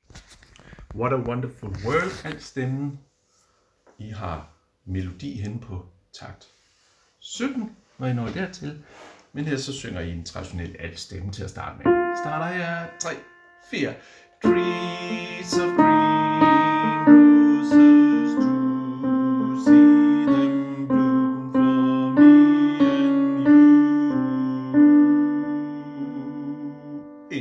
sopran alt; harlev koret

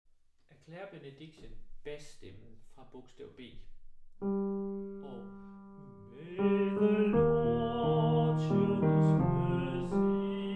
bas; harlev koret

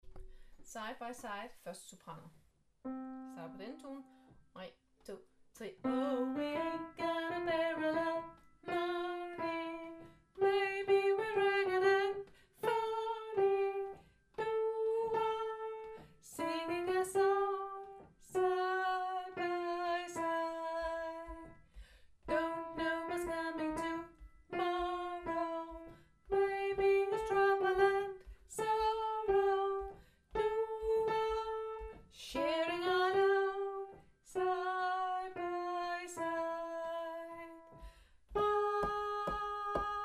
1. Sopran; harlev koret

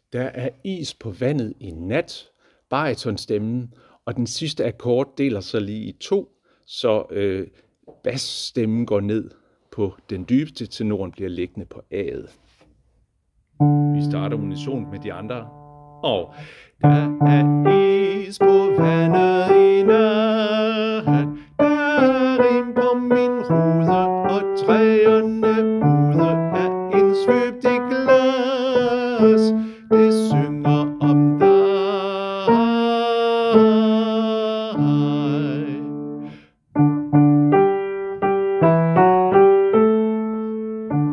baryton; harlev koret

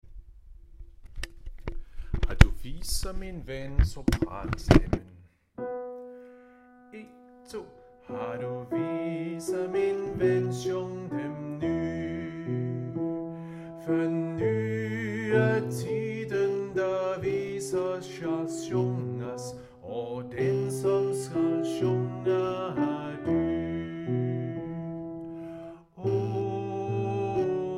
sopran

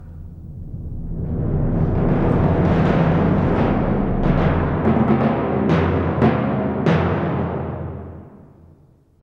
Hunters Drums

